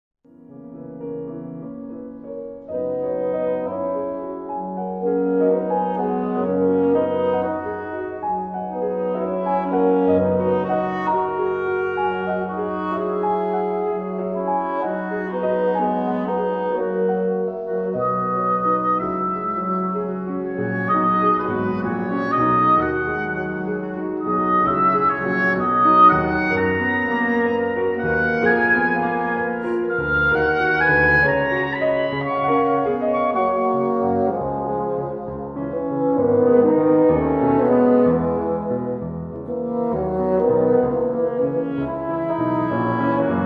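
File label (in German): Bläserquintett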